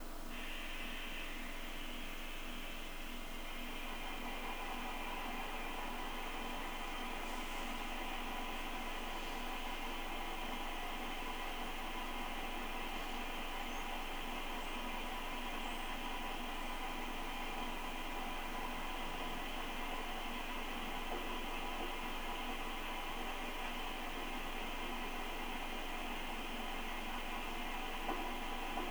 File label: Ambience; Ambience for April